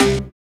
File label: Synths Root C